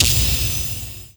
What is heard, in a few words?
Radiance Damage From Hero Tentacle